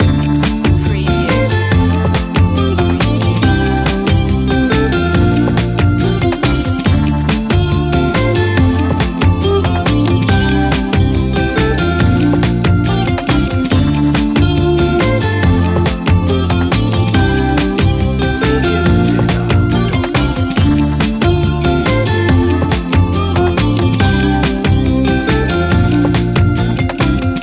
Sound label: loop